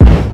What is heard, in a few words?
Kick